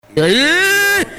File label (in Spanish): guarani grito iiiiiii